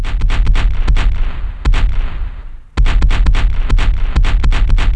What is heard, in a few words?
CANON, REVOLVER; East West - Global Sounds